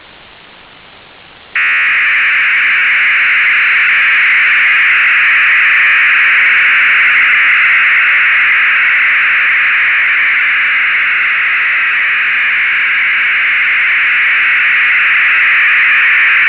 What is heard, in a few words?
50595 usb bpsk 1200bb